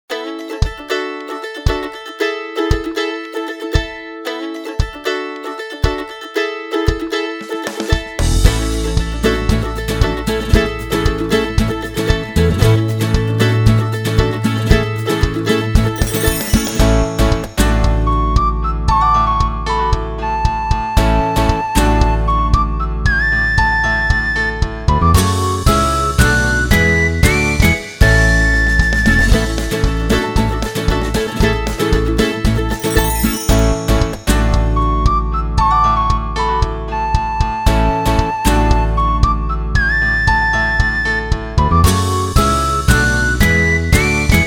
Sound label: Music Only